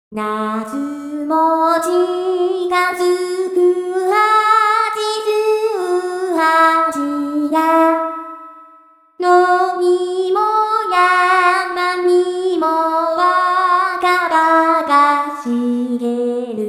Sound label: Tea picking voice; chatsumi